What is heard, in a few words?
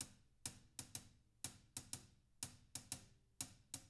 HIHAT LOOPS, Living Drums